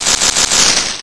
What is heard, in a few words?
skulltula drop, enemies; zelda